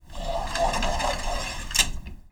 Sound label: BIFOLD, DOORS